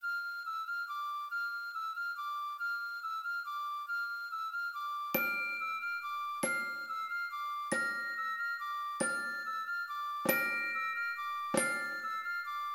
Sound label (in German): Trommel [140]; Carol of the Bells